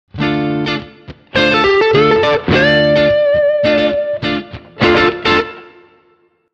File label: Pentatonica